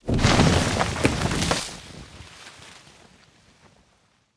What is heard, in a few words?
body drag; old sounds from the trailer